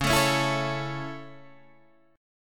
C sharp Minor Sharp 5th C sharp Guitar Standard, chord; strummed; JGuitar